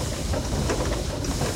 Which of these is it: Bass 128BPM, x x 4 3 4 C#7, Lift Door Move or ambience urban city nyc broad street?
Lift Door Move